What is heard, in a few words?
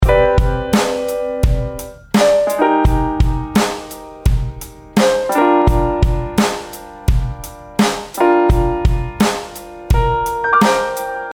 klark teknik 76KT ratio20